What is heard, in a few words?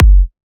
Punchy Kick